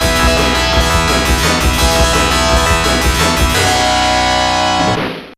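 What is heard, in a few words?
background music; 1941 - Counter Attack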